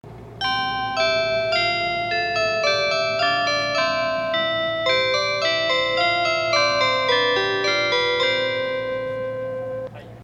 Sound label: 183 chime; higashinihon